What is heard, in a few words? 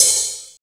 light hi-hat closed sound A key